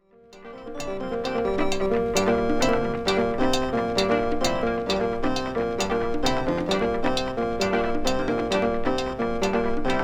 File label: zombie